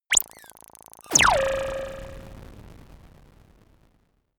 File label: laser shot crackle; krieg-waffen; soundpool